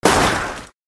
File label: Impact